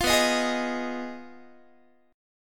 B 6th Flat 5th B down Guitar 4A 4E 5A 5D 5G sharp 6C sharp; B6b5; strummed